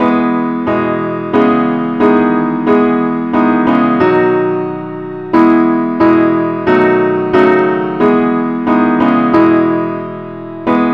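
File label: advance accompaniment key e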